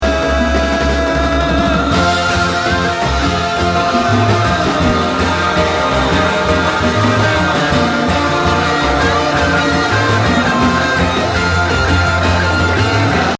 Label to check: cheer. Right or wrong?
Wrong